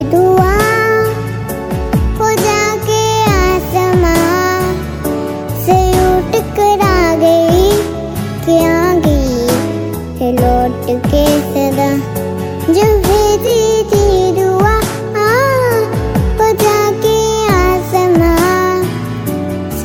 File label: little girl ringtone